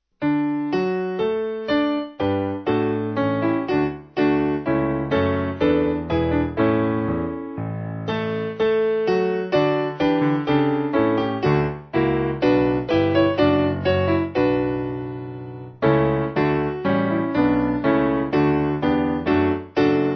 We Limit Not The, Petersham, Piano

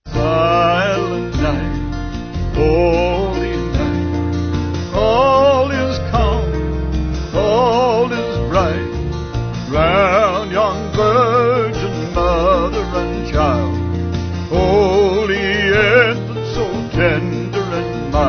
Silent Night, Stille Nacht, Vocals Band

